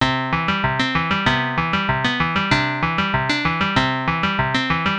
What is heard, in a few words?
intro full speed